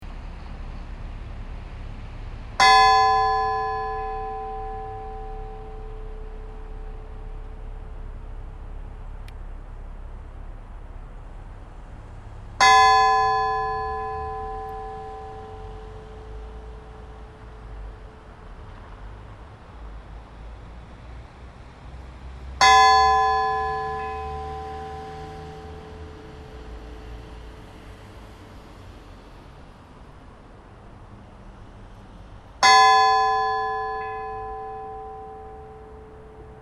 laugarneskirkja likhringing, Kirkjuklukkur; reykjavikurprofastsdaemi-vestra; Laugarneskirkja var vígð 18. desember 1949

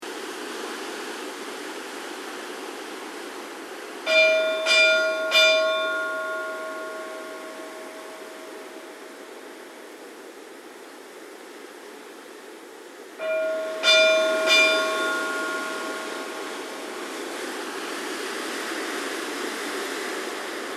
stadarkirkja reyk stora, kirkjuklukkur

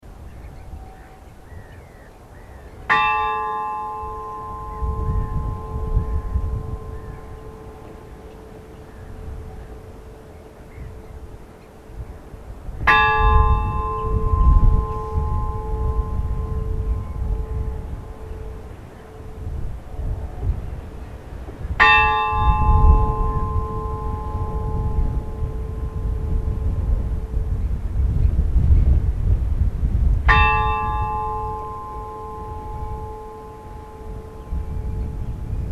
helgafellskirkja likhringing uti, kirkjuklukkur

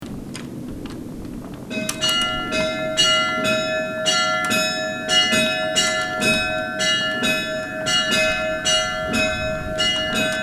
stadarholskirkja badar, kirkjuklukkur

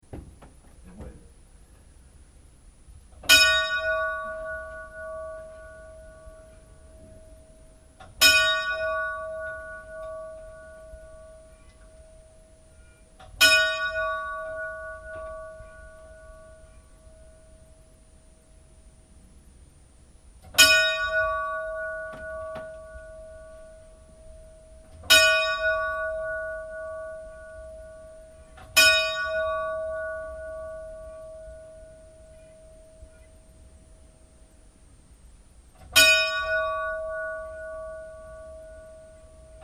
hvalsneskirkja 3x3, kirkjuklukkur

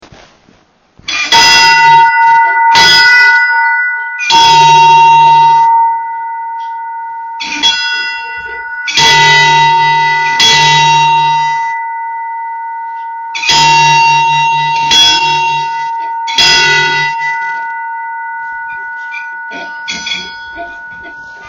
brunnholskirkja 3x3, kirkjuklukkur

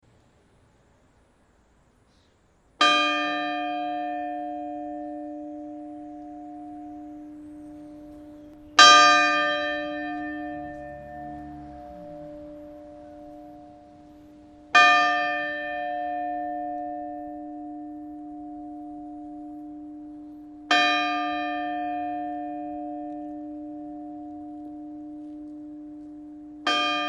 grensaskirkja likhringing, kirkjuklukkur

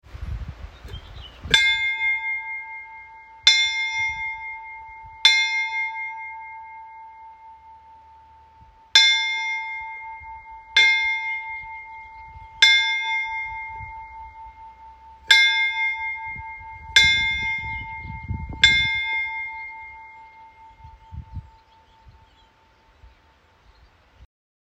bjarnaneskirkjugardur, kirkjuklukkur

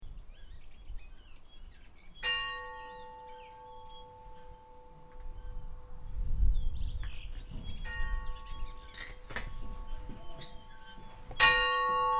kvennabrekkukirkja likhringing, kirkjuklukkur

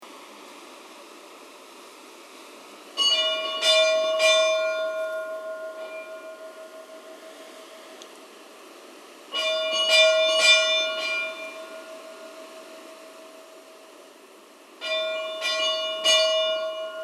stadarkirkja reyk badar, kirkjuklukkur

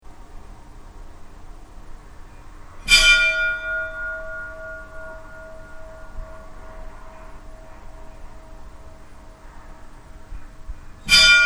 borgarkirkja likhringing, kirkjuklukkur

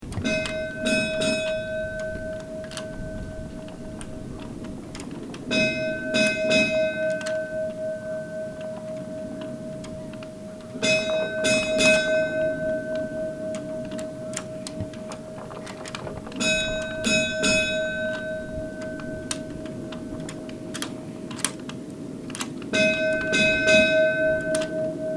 stadarholskirkja staerri, kirkjuklukkur

